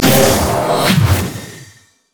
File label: call of the void; LoL; alzahar